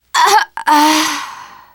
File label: death scream, teen girl